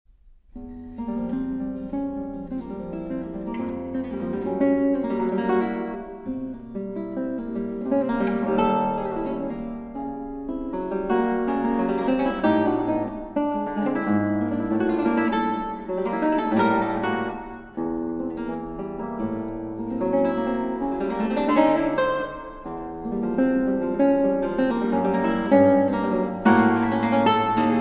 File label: solo